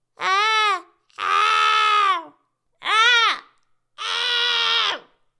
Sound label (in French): falsetto et falsetto twang; les effets simples